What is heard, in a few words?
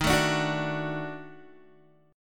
Eb Minor Major 7th Flat 5th Eb down Guitar; EbmM7b5; strummed; JGuitar